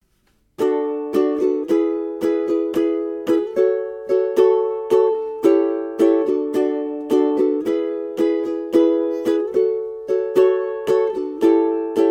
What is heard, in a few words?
Chorus Strum, ukulele